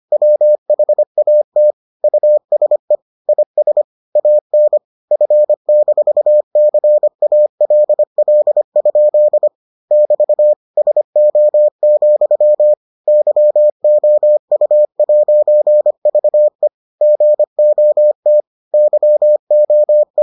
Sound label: morse